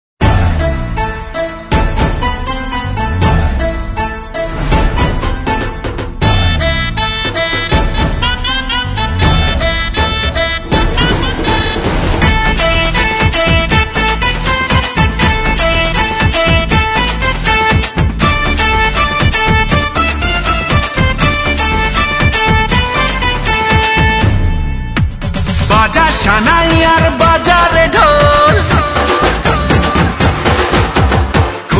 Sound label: baja sanai ar baja re dhol ringtone